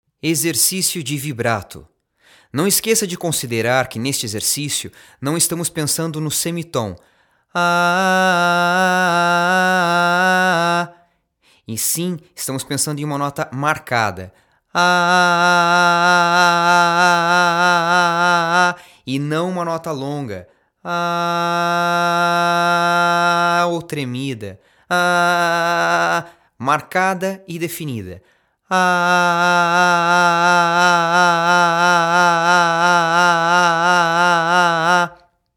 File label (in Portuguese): Vibrato; Introdução